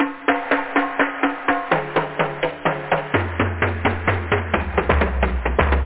bongo

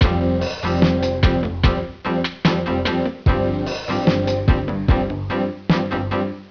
loop; MediaMaker demo 1.0

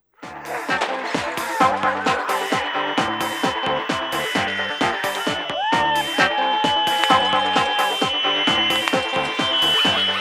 tv Action; Singles; French Version

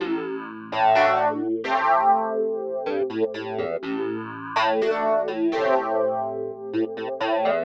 synth house 125 A; eJay; Keys

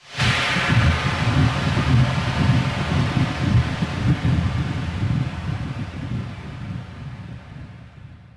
fissure bubbles; DAoC

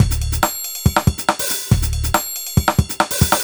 Chime

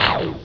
Plasma Hit; BOOM

